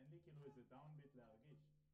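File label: SNR Bot, snare bottom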